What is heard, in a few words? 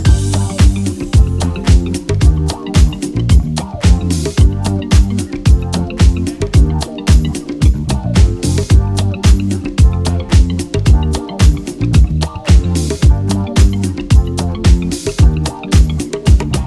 Disco Soul Instrumental Version